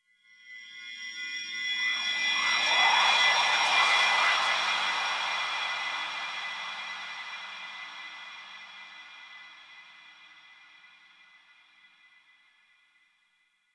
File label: SFX Evil Whispers; Warframe; The War Within; Mountain Pass Return Peak; Cinematics